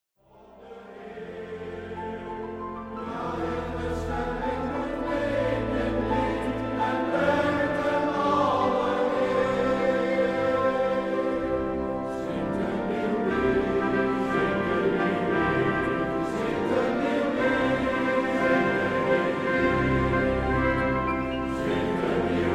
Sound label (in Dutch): zingt een nieuw lied